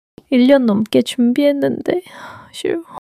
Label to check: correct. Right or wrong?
Wrong